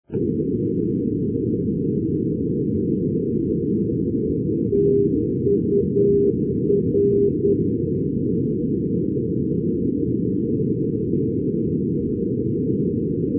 ndb tours tur 331; paris; europe

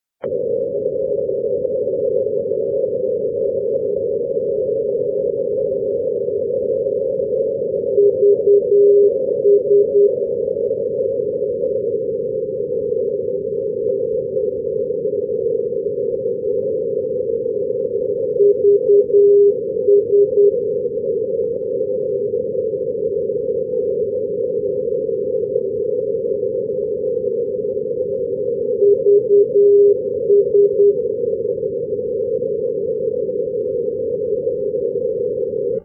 ndb valenciennes; vs-317; paris; europe

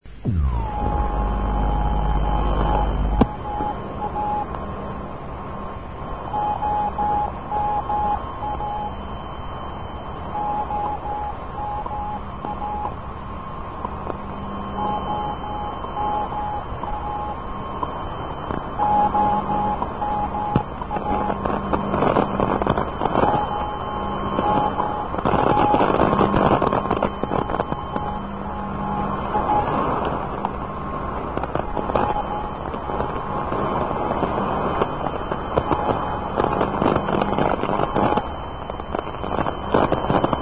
praha cw; europe; ts praha lublice; 'oma'; rainer brannolte

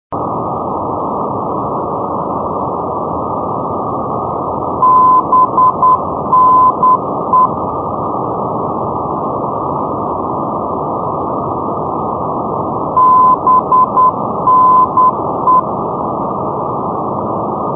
ndb hewanorra

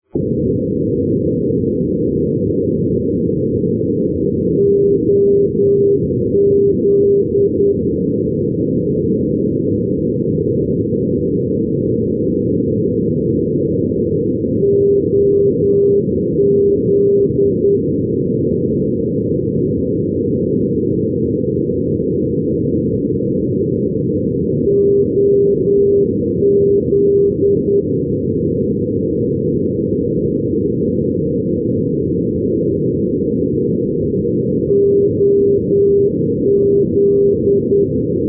ndb brussels national; oz-314; europe

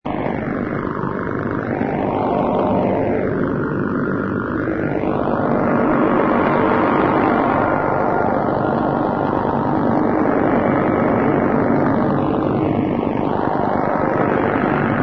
us embassy nicosia cw; us embassy nicosia 'kwn90' cw (1973)

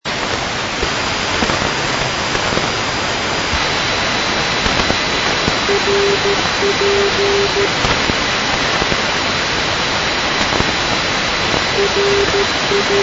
ndb dashoguz; tkm

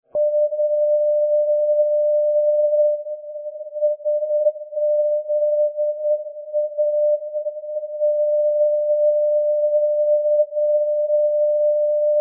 ndb solenzara; sza-349; europe

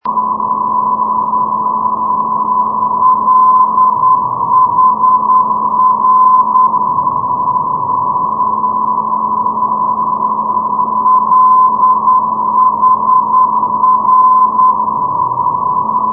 ndb 337.0; lahr lhr; europe

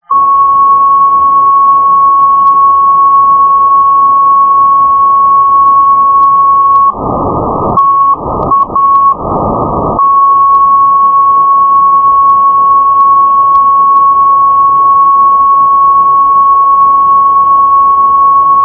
ndb villacoublay ta 286,5; paris; europe

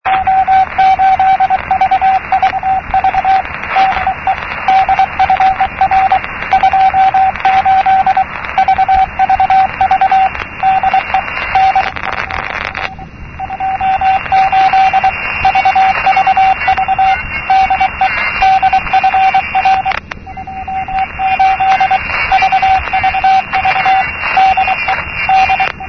radio cw; marpress frankfurt radio'dfr28'; europe

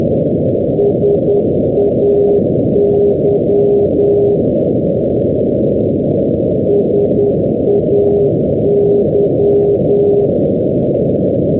ndb stornoway hebrides; shetlands; europe

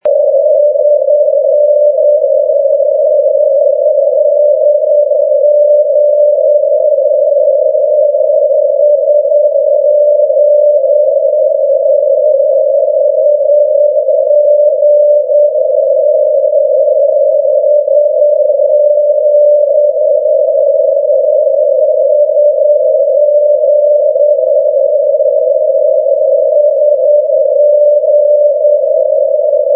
ndb 409.0; satenas; europe

